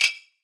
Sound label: percussion oneshot; Pop; Drums; SD PASADENA Pop Sample Pack; Slate